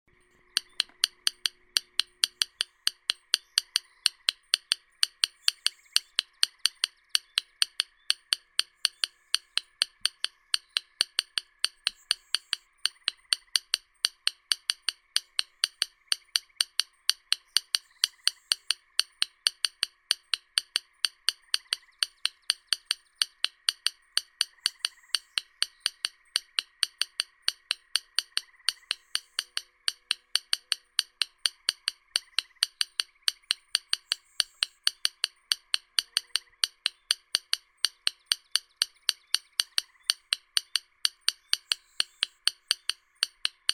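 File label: Swinhoe's rail, Coturnicops exquisitus; pogonish belokrilii; zvuki prirodi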